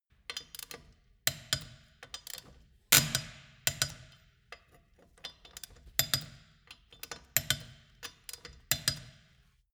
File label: wheel change sequence screw and hammer; schrauben drehen hydraulik